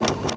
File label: switch